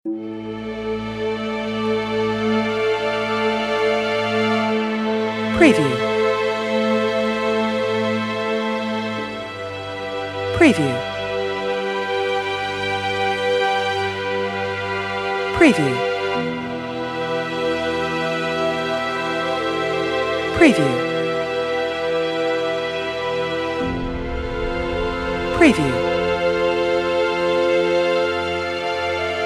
Cinematic Emotional Sad Music Film Score Soundtrack